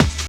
BD, Drum & Bass, 175 BPM; Ueberschall; Drum & Bass Flash Trackz

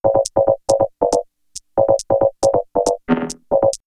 Vocoder Loop; backtothe70's; X-Static Goldmine; VocoderLoops

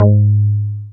BAS FM DX-7

